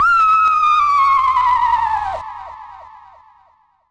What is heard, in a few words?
Munch Scratch; Vocal FX; Zero-G Smoke